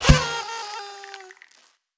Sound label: Effect Celebrate